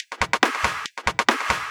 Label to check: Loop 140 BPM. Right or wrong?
Right